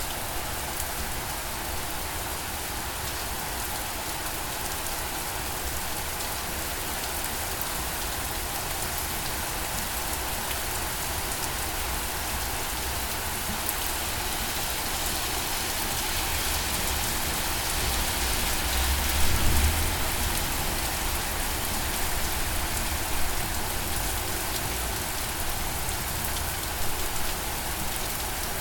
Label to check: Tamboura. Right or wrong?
Wrong